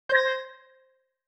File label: URGENT ALARM